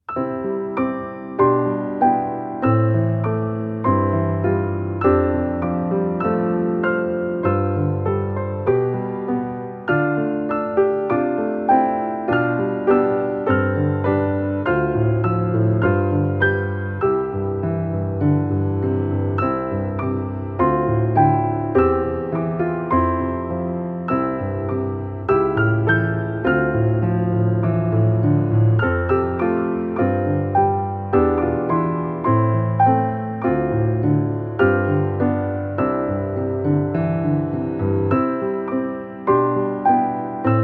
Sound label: Rising Star with teacher duet